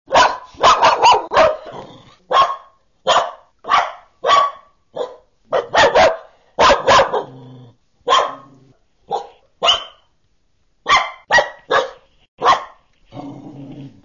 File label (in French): nature aboiements long chien enervant